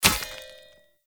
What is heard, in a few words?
Short Sword Throw Medium Impact Rock; Player